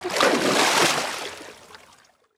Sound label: splash water emerge; Ninja Blocker